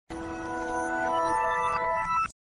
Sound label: flashback